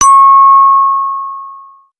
chimetone

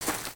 Footstep; Lego; Strombie; creatures organic

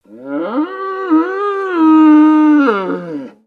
Pet Buffalo Beg; creatures pets; Lego

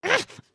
Angry, emotes

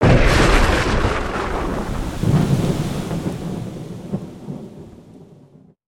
Thunder Storm

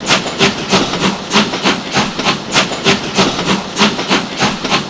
Train Track Loop; aronwk collection; ndaudio

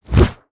Owl Wingflap; Valiant; Summoner; aronwk collection; ndaudio

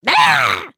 Air Fall; creatures organic; Lego; Strombie

